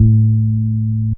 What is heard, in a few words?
BAS TON; E-Lab; X Static Goldmine 2; akai